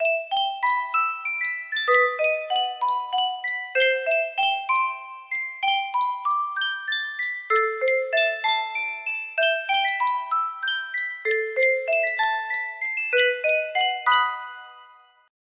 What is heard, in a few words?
Yunsheng music box, music box melody